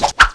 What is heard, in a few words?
jump, poison headcrab; hl2